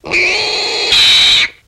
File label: Pig Squeal Painful